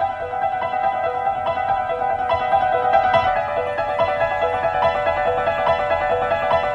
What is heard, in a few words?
piano dies down, sad loop; Loops